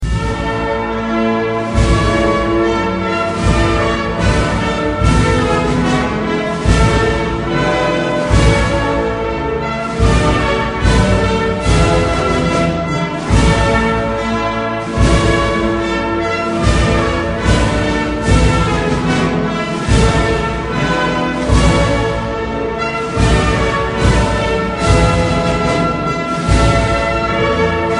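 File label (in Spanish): Himno De España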